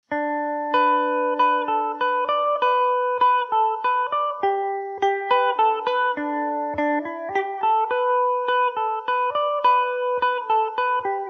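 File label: G major, Irish mandolin; inisheer; revelation clean setting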